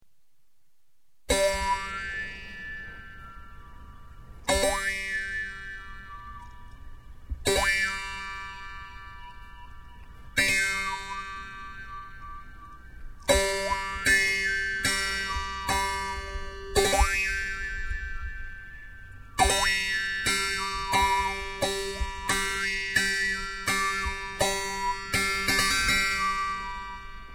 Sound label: jews harp a wandering; Tuva Mongolia; Altai; Vargan